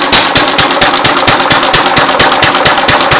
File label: witte, engines